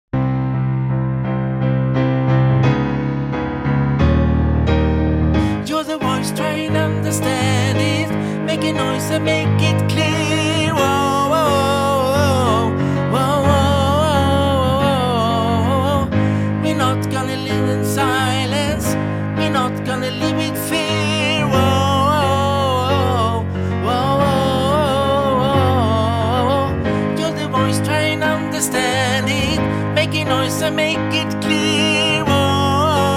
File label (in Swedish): tenor